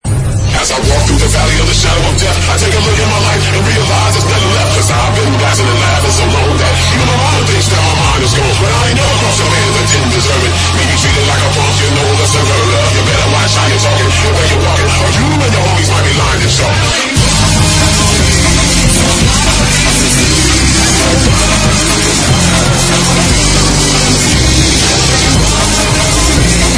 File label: bass kick